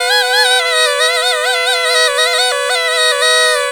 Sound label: PUNGI